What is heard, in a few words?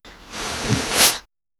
wrapping neck velcro seal; cloth